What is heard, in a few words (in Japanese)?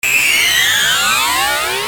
四角波 fm 上げながら下げ